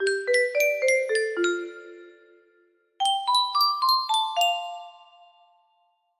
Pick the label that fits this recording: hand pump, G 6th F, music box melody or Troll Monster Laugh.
music box melody